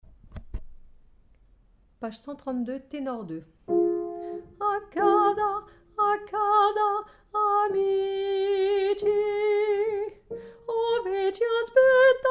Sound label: Tenor